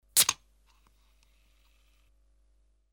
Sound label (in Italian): FIAMMIFERO